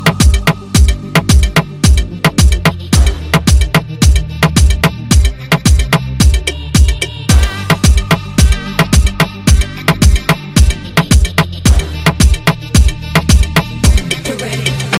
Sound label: bass dance ringtone